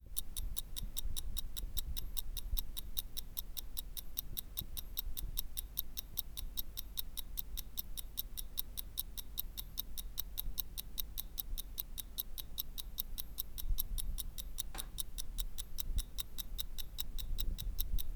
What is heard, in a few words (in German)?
Taschenuhr; Kienzle2